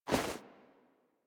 Movement Foley; Warframe; Wisp

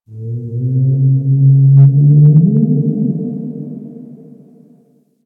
Night Distant Creature, Valley; Ambience; Warframe; Quests; The New War

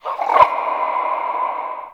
Under City Small Creature

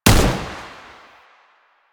AK47 Fire; Warframe; Tenno; Weapons

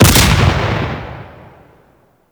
Cannon Shot, Weapons; Grineer

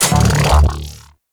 Mech Start; Nechro Mech

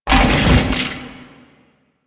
Bodyfall

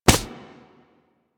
Melee Fist Impact Generic

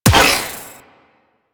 Sniper Proj Explode; Warframe; Weapons; Sentient; Crp Sent Amlg Sniper

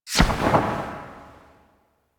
Bullet Jump; Characters; Warframe

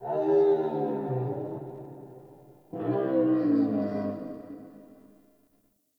Forest Open Glade Creature Far, Day; Ambience

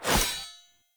Short Sword Whoosh; Weapons; Enemy; Swords; Sinecure Short Sword

